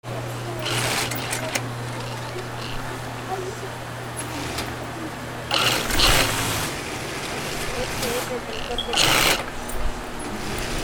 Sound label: INDUSTRY